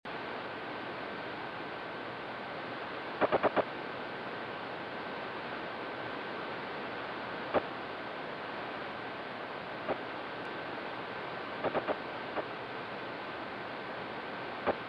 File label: Possible meteor burst 41.61MHz